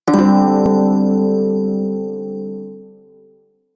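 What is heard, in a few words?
Prime Shawzin Pentatonic Major Chord B; Music; Warframe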